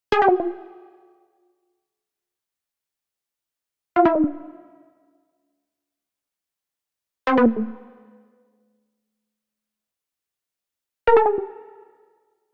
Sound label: Arcade Jingle Negative; boom library; The Interface